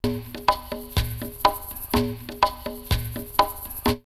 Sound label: Belém 124, drumloops World & Ethnic; X-Static Goldmine